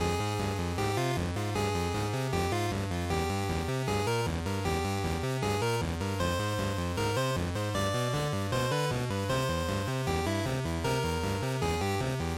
Battle Loop; GB RPG; bgm

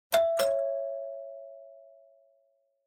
Doorbell Mech Tone, Close

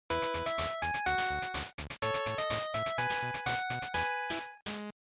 Shooter Clear, bgm

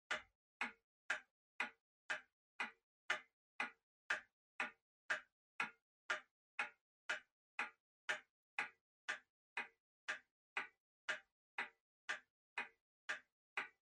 Pendulum Clock Hardware Far Tick

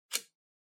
RJ Wall Socket Tel Shutter Open

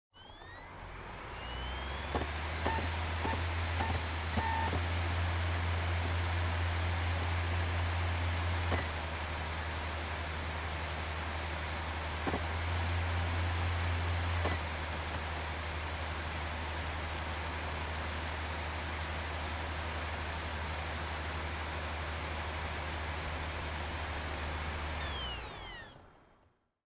Optical Disk Drive Operating Noise

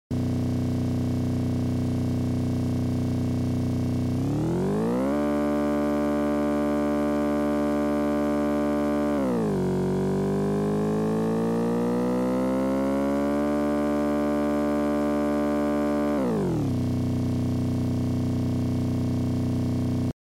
NES Racing Engine

